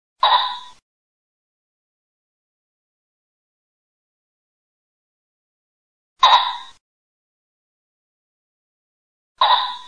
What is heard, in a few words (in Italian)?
FAGIANO